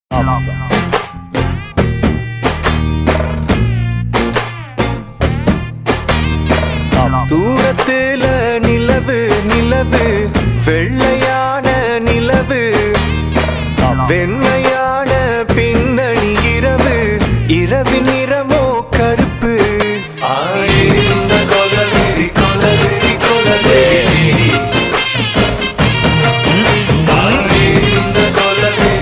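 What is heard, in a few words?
Tamil Melody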